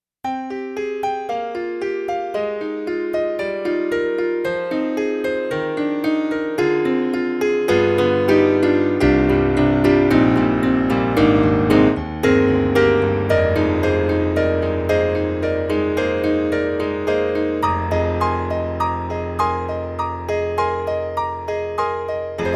Kurzweil MicroEnsemble ME-1 Tack Piano

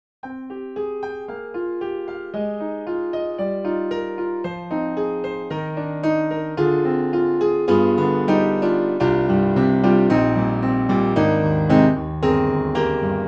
Warm Grand

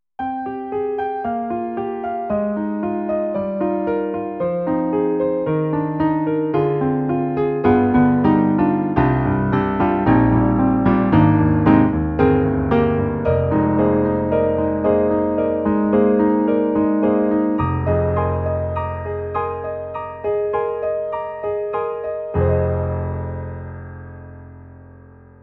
EDIROL SD-90 Contemporary Ac. Piano Mild Piano

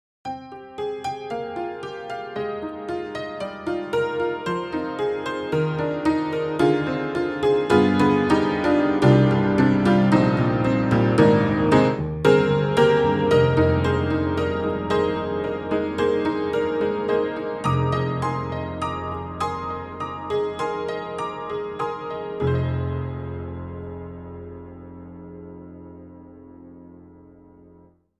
Upright Pianos Barroom Upright Sub Barroom; Synthogy; Ivory II; Sub Barroom Balled

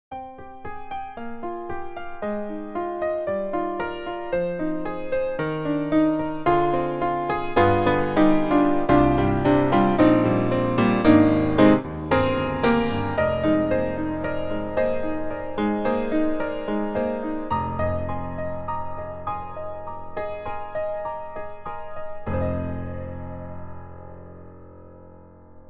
Stnwy Concert Grand, piano; IK Multimedia

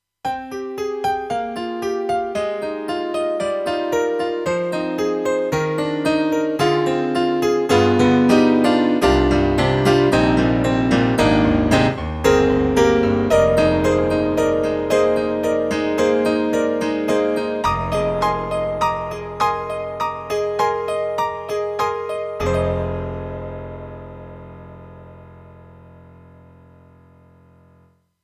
YAMAHA TG300 GM-B EP and Piano

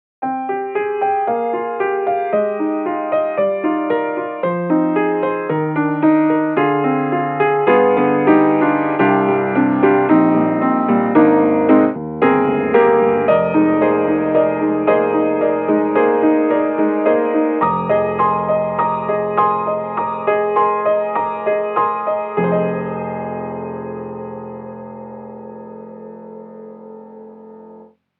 Modern Upright Modern Grit; XLN Audio; Addictive Keys

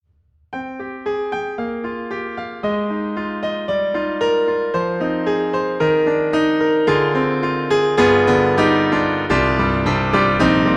Hyper Bright; MODARTT S.A.S.; Pianoteq; D4

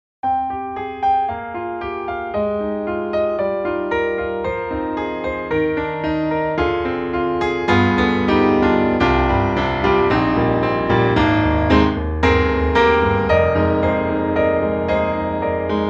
CFX concert grand, classic, vintage; GARRITAN; Vintage Evans

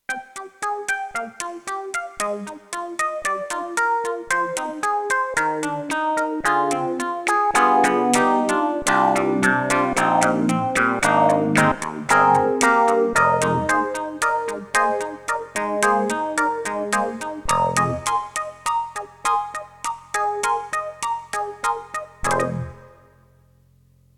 Yamaha MU1000EX Clavi Wah

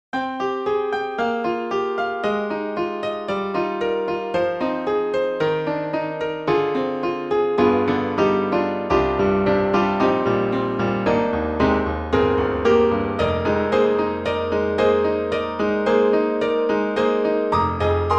Jazz Grand Piano

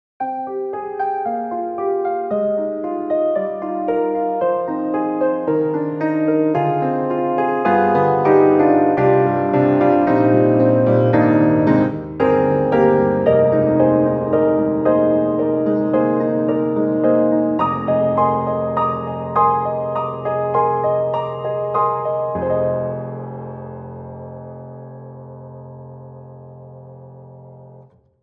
Wiry Piano String; Steinberg HALion 7; Verve

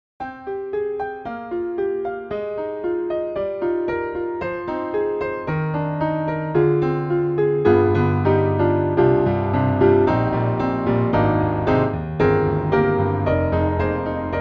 D-PRO Vertical Specimen; Cakewalk

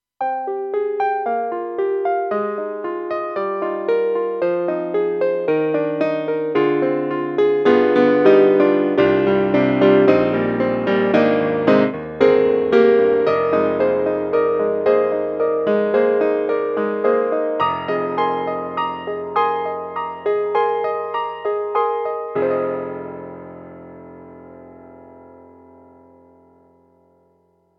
AKAI SG01p, MELLOW PIANO

